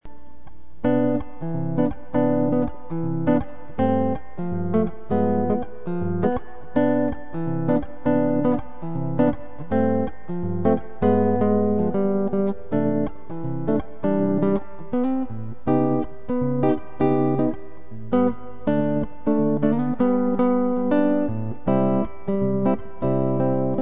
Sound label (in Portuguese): parabens pra voce jazz